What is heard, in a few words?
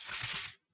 clean